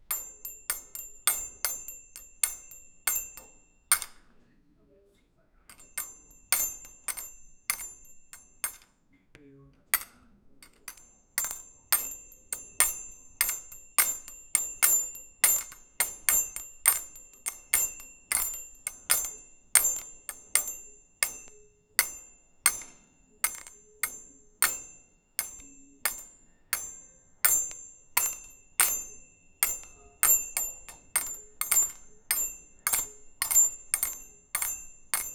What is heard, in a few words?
the sound of a girl, exercise